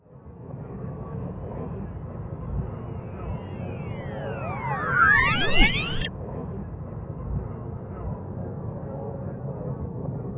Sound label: SAT. TRANS, Anime Sound FX, AMBIENT; AKAI Anime Sound FX